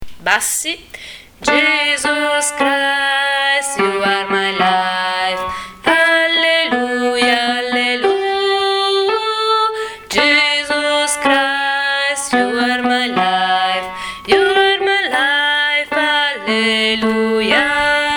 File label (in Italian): bassi; jesus christ